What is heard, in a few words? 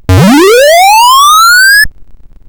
Manic Miner 64DX, CSG8580R5